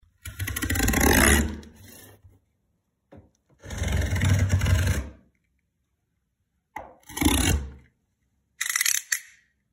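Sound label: rezhut karton yashik kanc nojom, cutting a cardboard box with a utility knife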